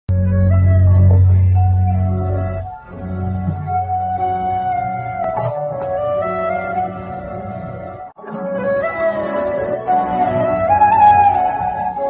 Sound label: song flute bgm; cobra; rk editing